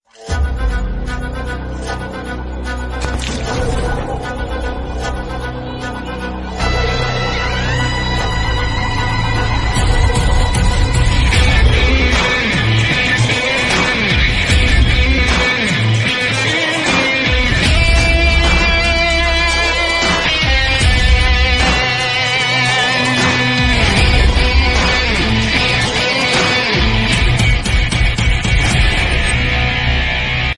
Tamil Ringtone; Vijay; Varisu; Thalapathy

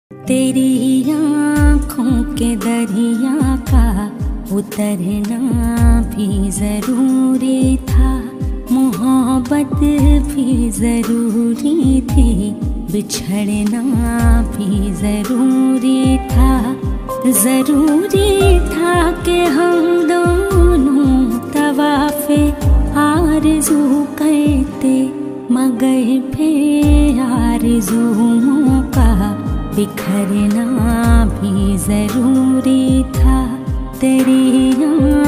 Female Version Song Ringtone

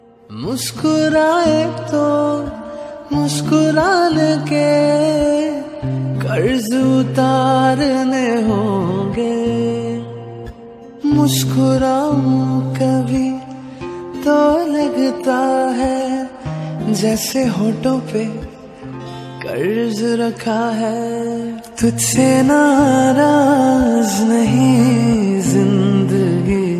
Sad Version

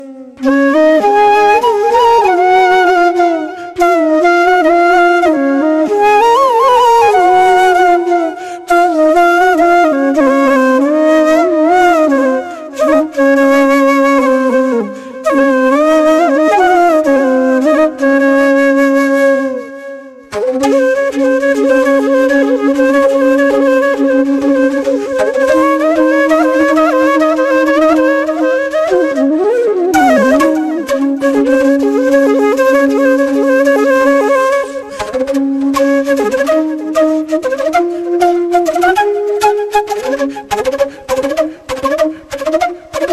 Krishna Flute Full Ringtone

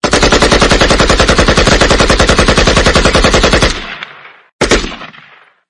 Non Stop Firing Gun Sound Ringtone; ringtones

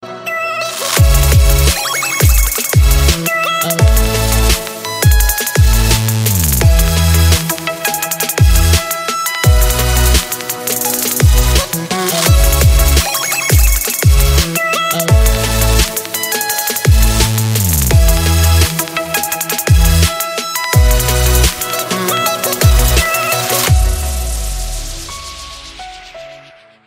Remix Ringtone; ringtones

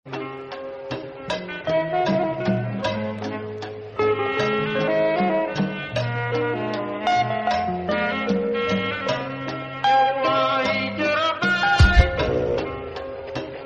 Bgm Ringtone

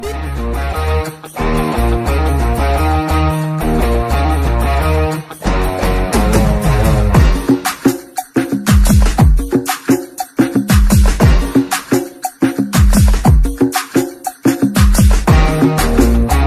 BGM Ringtone Tamil; Maari 2

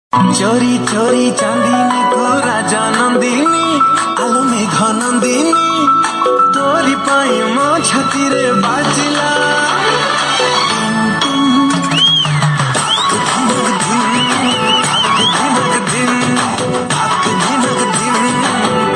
Odia Song Ringtone

